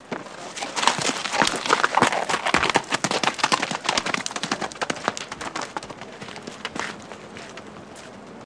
Horse Gallop Awy Shor

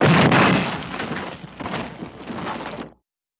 Blam Crash Debris, explosions

